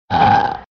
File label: BURP